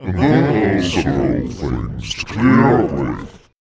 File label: VOICE FX, Dance Vocals